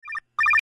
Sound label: motorola Ring; Continental